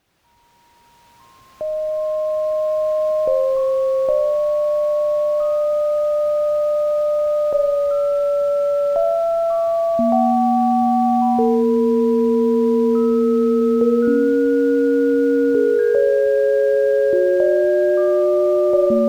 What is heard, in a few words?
rune tone